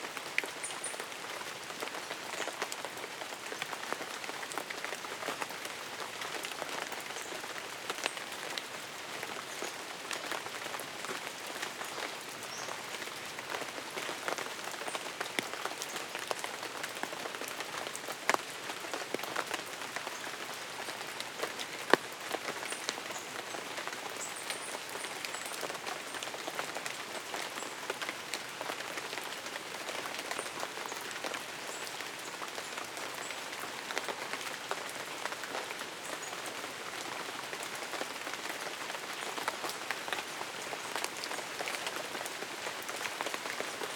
TROPICAL Rainforest rain moderately with sparse bird chirps